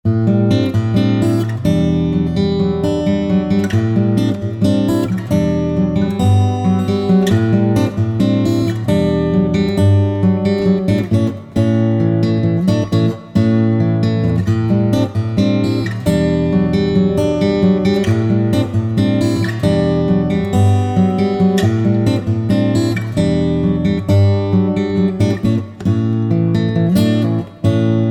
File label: martin d1, Guitar